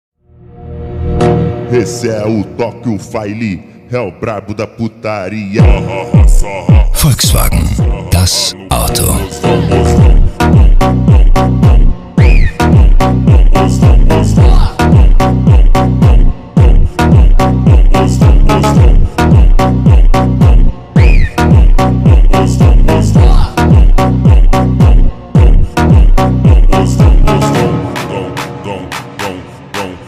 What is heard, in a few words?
phonk; volkswagen das auto